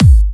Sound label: Trance Kicks, Bassdrums